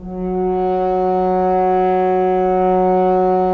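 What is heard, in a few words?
BRS FHN, Solo French Horn; Roland - LA Composer vol. 4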